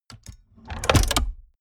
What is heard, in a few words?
mechanics medium snap